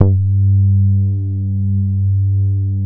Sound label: VCO bass G3; SE-1 BASSES